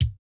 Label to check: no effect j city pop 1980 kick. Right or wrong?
Right